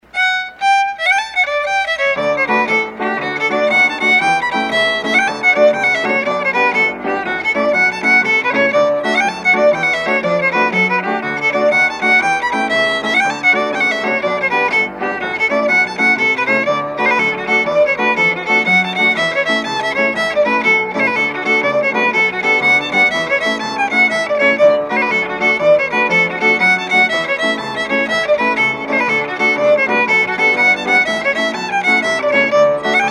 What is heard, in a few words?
Jig